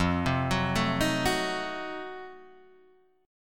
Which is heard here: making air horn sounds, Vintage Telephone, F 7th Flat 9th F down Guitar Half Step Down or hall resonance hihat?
F 7th Flat 9th F down Guitar Half Step Down